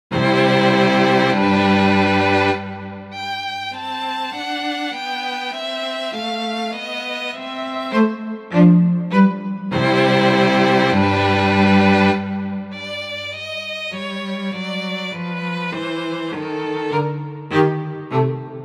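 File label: duet for violin and viola; i. albrehtsberger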